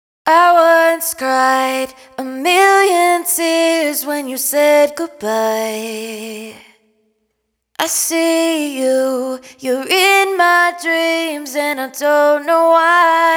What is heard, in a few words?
Vocal hook Am wet, Raw Vocals; Million Tears 128; Slate Digital Omnivox Vocal Sample Pack